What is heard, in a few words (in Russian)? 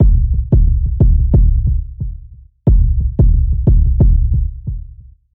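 Feather Beats, электро-джаз drums; 130 BPM; Electric Jazz; loop; Барабаны; Ударные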